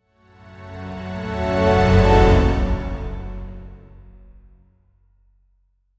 dramatic short and mournf

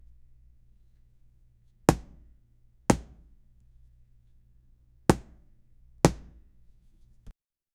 the sound of a ball, ball hit

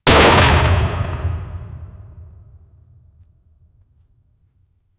cannon ball hitting the ship; sound effect

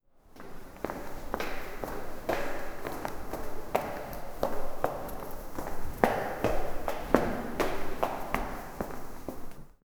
the priest is wearing, temple

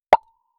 luxury style soft pluck

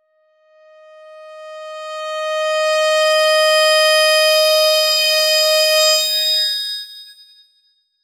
strings brass

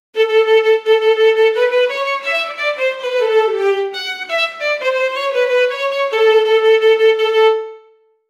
sad comedy violin

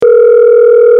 phone ring tone; devices

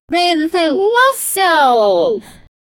human vocal

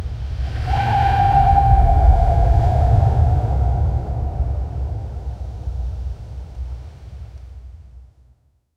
pov mask breathing dista; whale calls

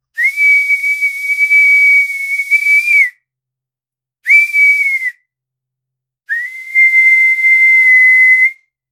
someone blowing a whistle

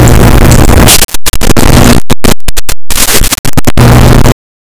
cloth unfolding quickly w

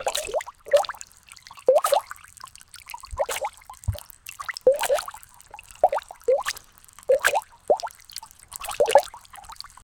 cartoon fountain with two

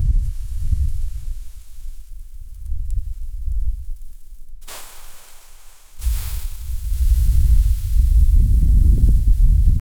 snow crunch gentle kick

